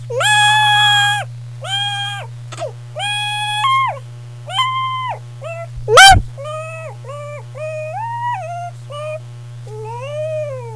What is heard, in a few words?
chipmunk; hall of moos